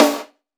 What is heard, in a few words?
Power Snare; Roland BK-5; Snares